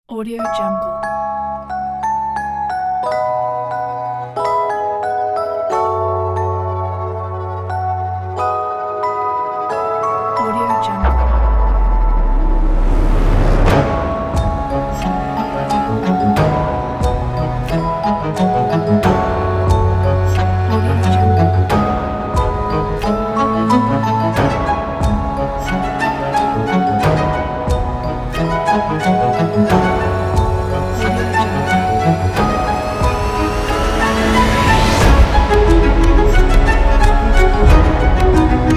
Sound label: halloween